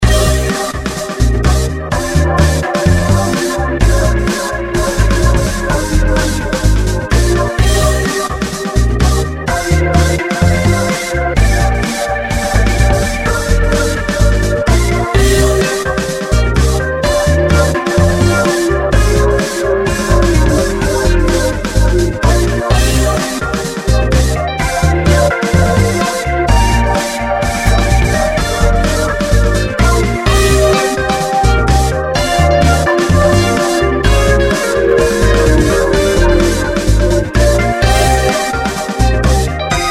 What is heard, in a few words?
instrumental